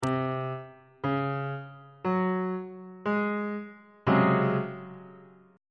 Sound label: c sharp major 7th suspended 4th 3rd inversion; bass clef